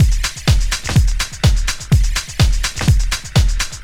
DEEPHOUSE BEATS 125

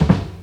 JAZZ BD, JAZZ DRUMSET

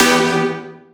synth shot E; Electro; Anthemic; synth shots

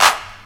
CLAPS; CLAPS+SNIPS

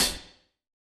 Hats, One Shots; Touch Loops; CosmicFunk

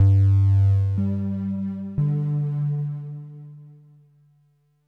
ECHO G1, SPACE ECHO

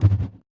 Perc, drums; Touch Loops; BlissedOutBeats

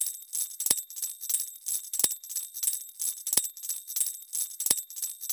ETHNO EXTRA 90; ethno2

